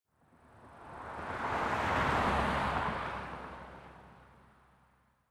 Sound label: Passing Car L R